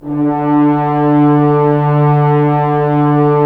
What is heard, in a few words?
Horns; Roland - LA Composer vol. 4; HZ Horns